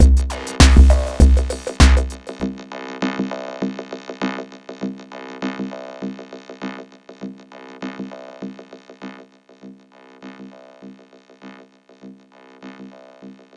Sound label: vocoder attack release